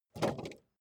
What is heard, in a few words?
car bmw e46 exterior bonnet unlock